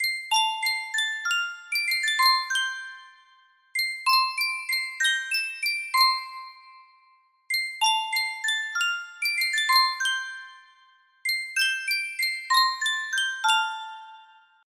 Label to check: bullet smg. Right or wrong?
Wrong